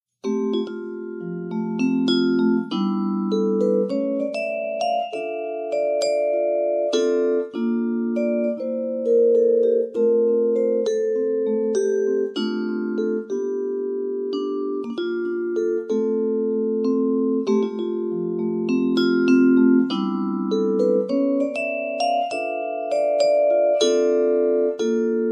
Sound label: Vibraphone